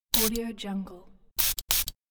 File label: spray bottle